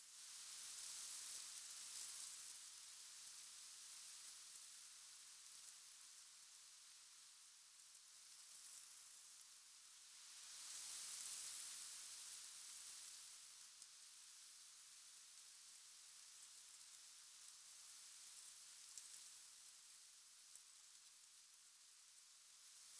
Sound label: ambience reeds; Rust